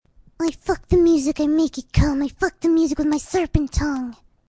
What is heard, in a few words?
Singing; I FUCK THE MUSIC, I MAKE IT CUUUUUUUM. I FUCK THE MUSIC WITH MY SERPENT TONGUE.; Fluttershy